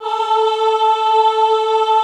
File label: AHH A3, Classical Choir; Best Service; Extended Classical Choir